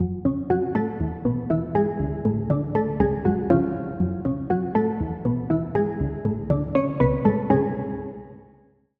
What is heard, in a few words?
Key; Routed Sound; Serum 2 Expansion - Story Walker Vol.1